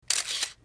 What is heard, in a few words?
camera clic single; KAMERA; KLICKLJUD